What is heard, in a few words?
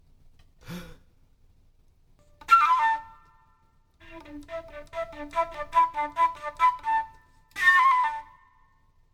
flute; MegaEpix Enormous